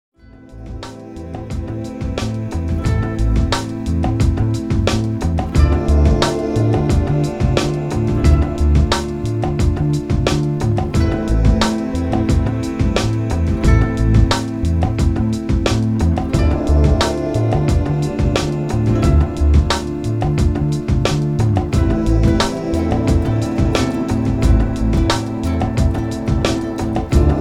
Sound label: Beats And Church Choir